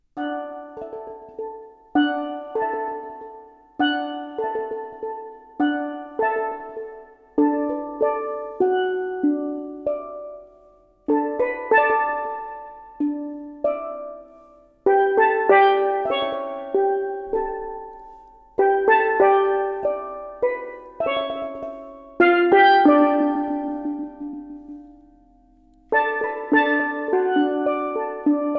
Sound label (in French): Le steeldrum